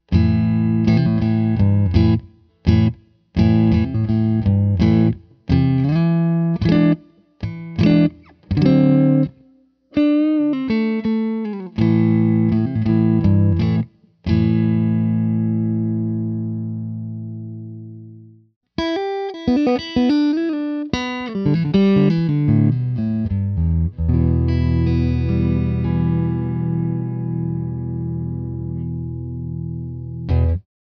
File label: Neck Clean